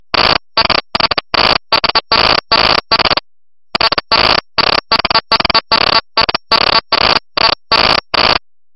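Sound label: hellschreiber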